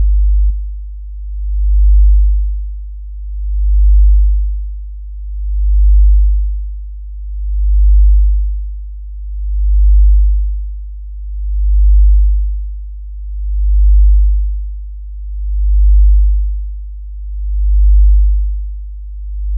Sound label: Ufo Pulse